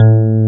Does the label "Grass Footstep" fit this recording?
No